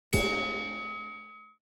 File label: ui room traininggrounds gong